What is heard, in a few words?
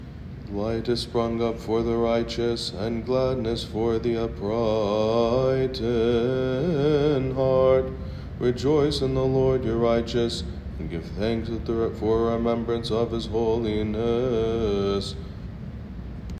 Psalm Chanting Joyful; Marin And Liturgy